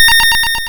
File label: Hard PCM Chip; chiptune